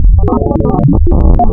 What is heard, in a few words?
PROPHET VS, wavetables, Classic Synths